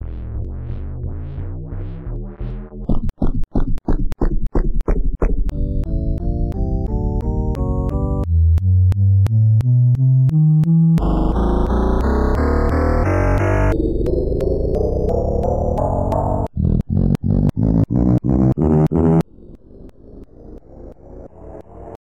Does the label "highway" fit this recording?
No